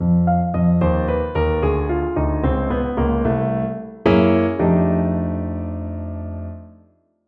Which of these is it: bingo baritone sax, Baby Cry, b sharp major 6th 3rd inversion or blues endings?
blues endings